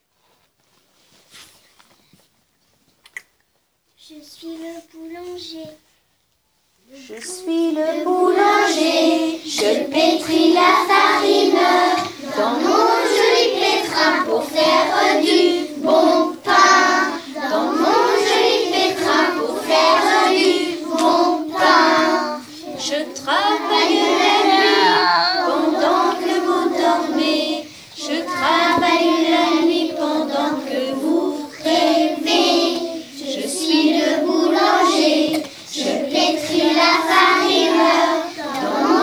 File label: Vocal